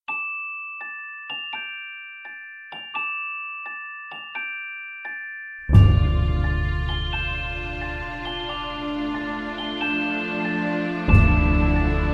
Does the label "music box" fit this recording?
Yes